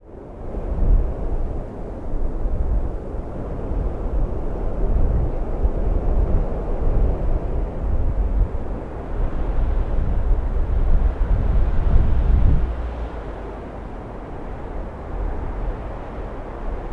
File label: Blackrock Wind